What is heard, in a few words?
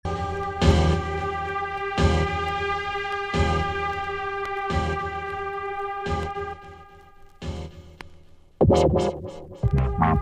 dnb